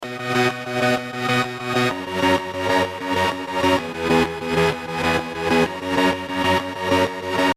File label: Bpm128 B Symphony